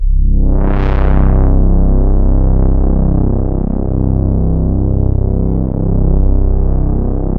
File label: MONO PAD; PADS